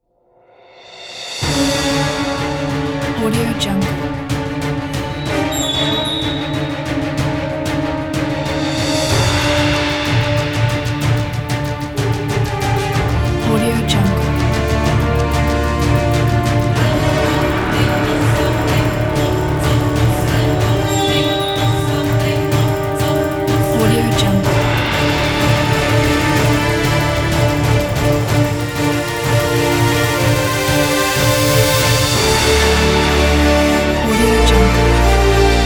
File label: hollywood heroic orchestra